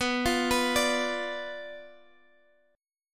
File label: B Diminished B Guitar Half Step Down